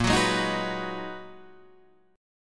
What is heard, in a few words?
Ab Minor 7th Bb chord; Abm7/Bb; strummed; JGuitar